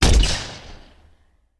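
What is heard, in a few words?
Artillery Grenade Launcher; Sound Ideas; Guns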